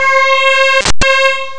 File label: lead flange; Amiga Soundtracker Sample Packs